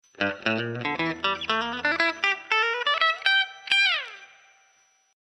country guitar

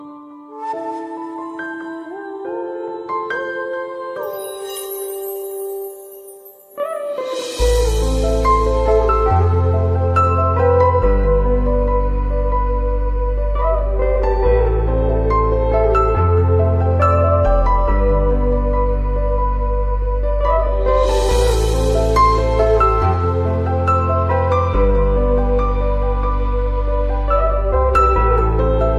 SAD LOVE TONE, ringtones

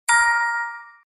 notification tone

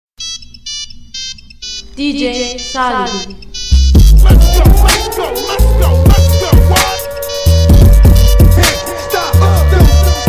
Nokia dj mix; hip hop

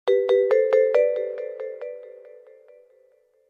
simple tone, sms; ringtones

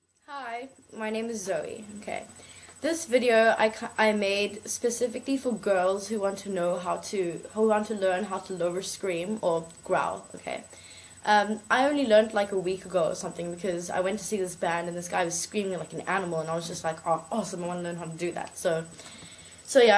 scream growl, exhale scream; how to scream growl for beginners

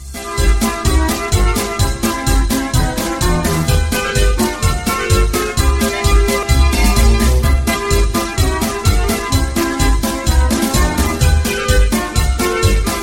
Funny Handy Polka

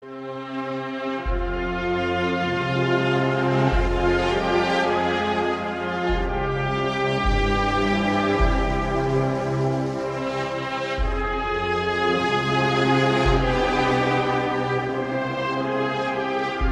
funeral song; classical

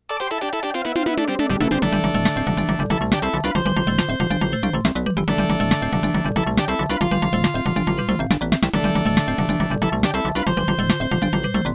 theme nes

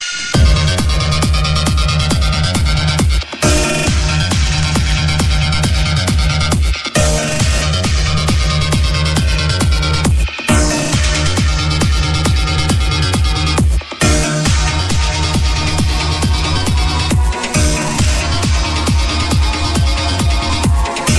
Suspense

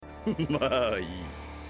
laugh; Madara mae

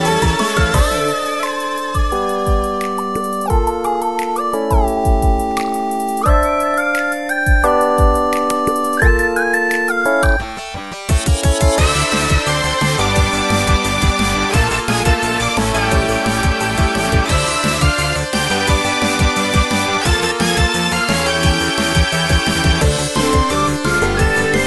Noteblock

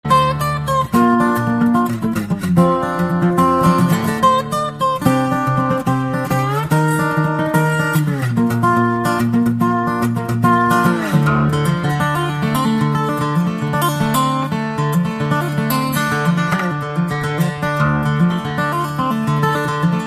acoustic guitar solo

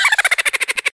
Evil Giggle

